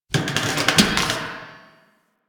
Derelict Metal Creak Small; Warframe; Orokin Derelict

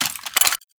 Pistol Reload; weapons; Warframe; Thanotech

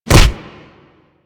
Brawl Punch B; Warframe; Brawling

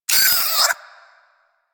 Vocal Pain, Hunting Critters; Warframe; Cryptilex

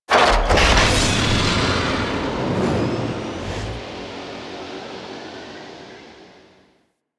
Cargo Train Exit Ship Detach; Warframe; Corpus Railjack

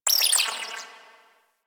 Tech Data Beep; Warframe; Ambience; Large Ramp Hall

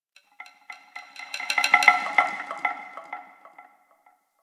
Wood Stick; Warframe; Ambience; Clan Dojo; Dojo Randoms

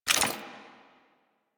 Generic Footstep; Warframe; Dagath

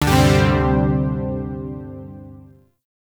Shawzin Pent Maj Chord B; Chords; Warframe; Zariman

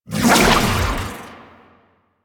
Archon Whip Whoosh; Enemies; Weapons; Warframe; The New War; Nira

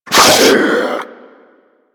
Infested Diseased Ancient Breath; Warframe

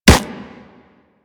Run Snow, Footsteps; Warframe; Kubrow

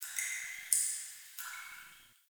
Cave Drip; Ambience; Caves; Glades Of Andurin

